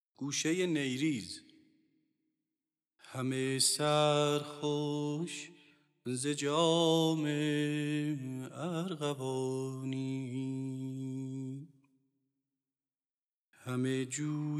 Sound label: Gushey e neyriz Mahur Radif; Dastgâh Mahur; Radif-e Karimi